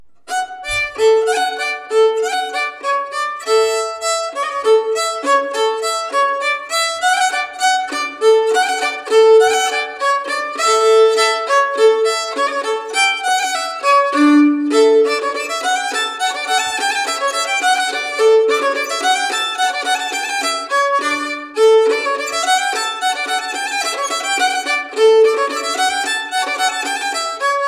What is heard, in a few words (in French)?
Valse